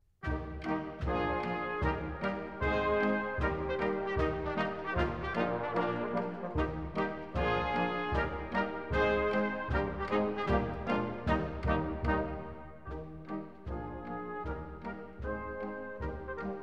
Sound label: Schottische; Ripley